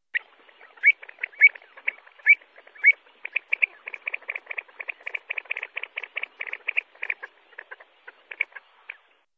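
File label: shiloklyuvka golos, pied avocet voice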